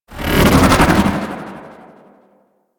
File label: Void Carry In; Generic Abilities; Mods